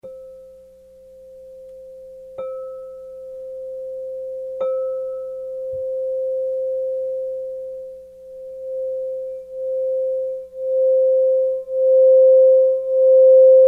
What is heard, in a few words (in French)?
Son Chakra C; Singing Bowls